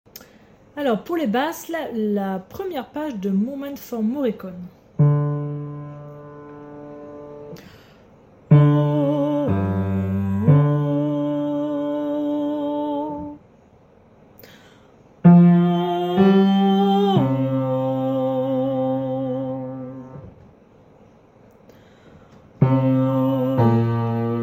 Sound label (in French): Basse